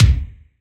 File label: kick, acoustic kit